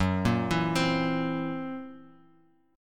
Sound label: Fsharp 7th Flat 5th down Bass Standard; arpeggiated; JGuitar